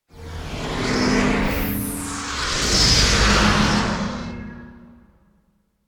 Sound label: Field Mat Tap; Yu-Gi-Oh! Master Duel